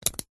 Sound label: household padlock metal click lock; zapsplat